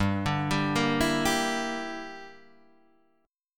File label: G Minor 6th, Guitar Standard